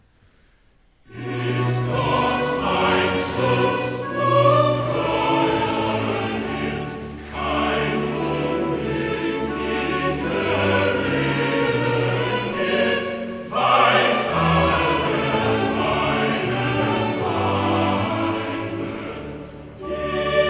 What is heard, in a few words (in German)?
Choral; Bach; Cantatas; Ich bin ein guter Hirt; Ist Gott mein Schutz und treuer Hirt